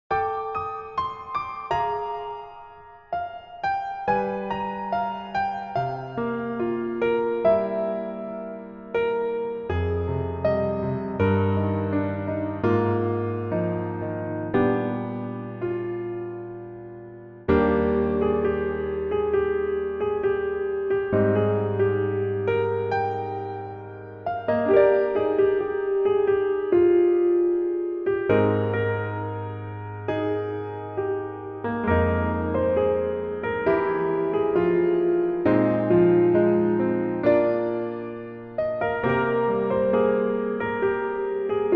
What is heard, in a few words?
Piano MR, 멜로디MR